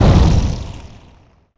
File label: Fireball impact, imp fireball; DoomRTX